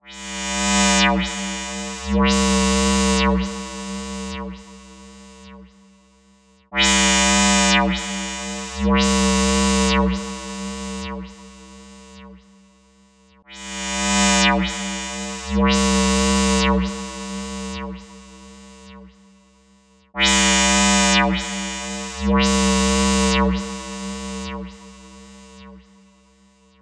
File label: Minimal